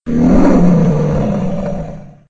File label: Roaring Lion; WoWInterface; WeakAuras